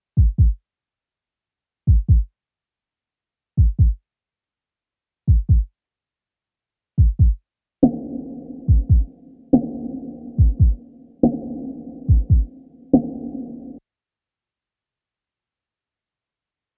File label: 141 BPM B Min Drums; Melodies; Loop Stems; Cymatics; 2022 Melody Mystery Expansion; Aerials